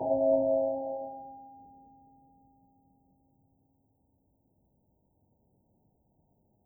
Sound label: hum prime tierce quint; cccbr; glossop